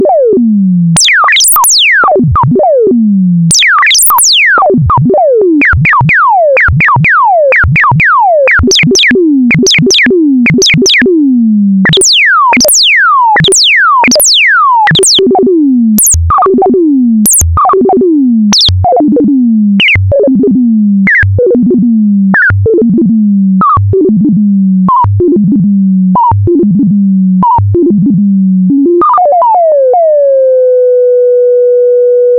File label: self rez fm; modular demos